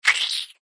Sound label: cartoon squelch squish mouth saliva